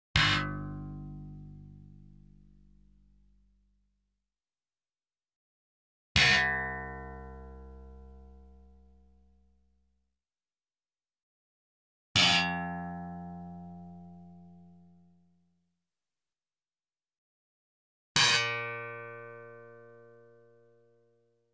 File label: bass slap 1 e1a1d2g2; Sound Sampling Collection VOL-5; Drums & Bass Fusion Funk Effect